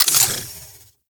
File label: Modular Secondary Clip Mech; SU Modular Guns; Clips